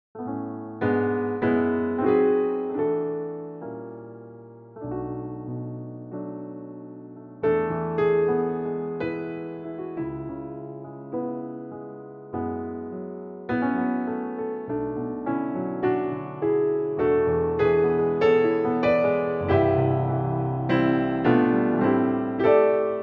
Classic Grand; VIVO H10

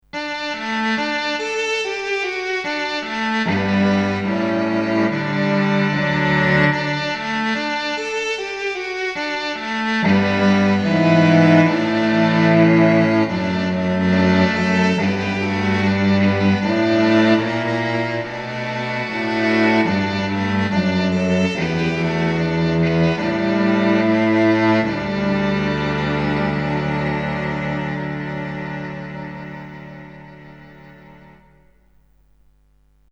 Cello Tape; VIVO S10

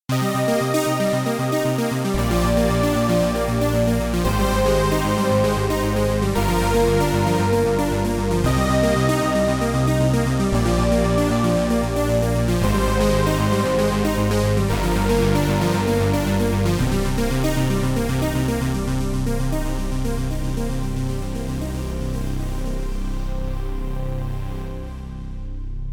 OB Synth; VIVO S10

